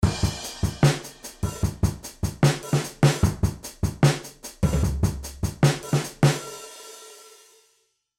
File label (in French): Batterie avec pan; Realisme